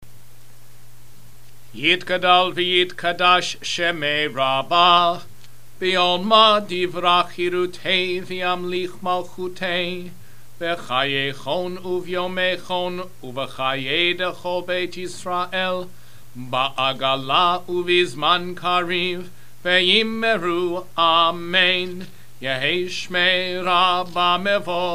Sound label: full kaddish